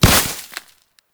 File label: Great Sword Melee Impact Vegetation; Weapon Impacts; Player; Swords; Environment; Founders Great Sword